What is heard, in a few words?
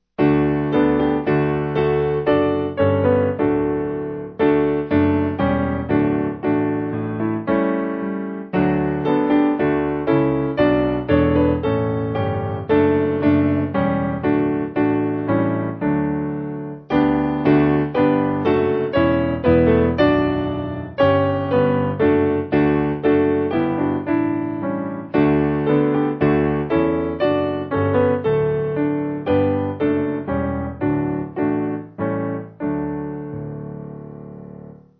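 Piano, Music Backing Files for Churches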